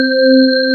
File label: VS37 Wave C3; Proteus 2000; Digital Sound Factory Proteus 2000